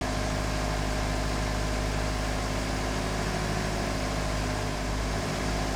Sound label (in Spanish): car motor andando; Steven Universe - Beach City Drifters